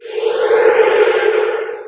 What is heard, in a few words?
apc move start; Emperor - Battle for Dune